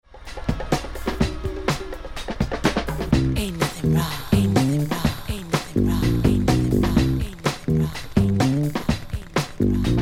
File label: Afro Funk Mix